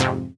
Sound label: Fm Dub Chord